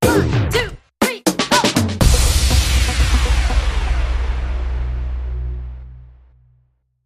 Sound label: Tutti Flutey